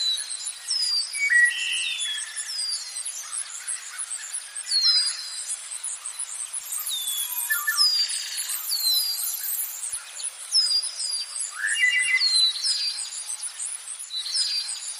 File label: spring birds; Nature